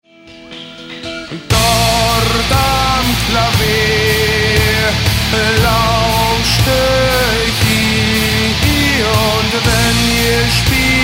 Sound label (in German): klavier